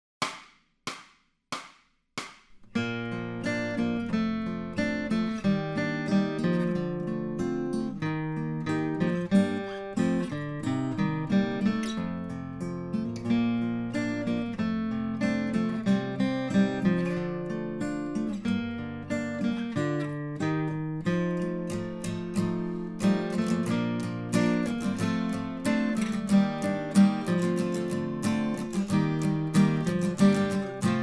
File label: acoustic guitar, accompaniment; Grade 8